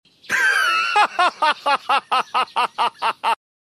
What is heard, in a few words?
laughter